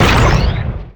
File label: Grenade Launcher Shot; Weapons